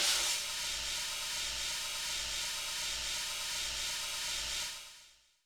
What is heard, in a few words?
New Jazz Snare; Snares